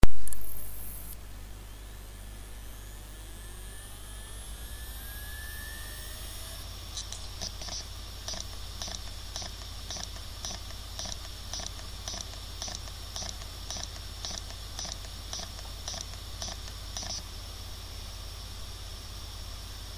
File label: Damaged Western Digital Hard Drive; What are the Quietest hard drives (HDD)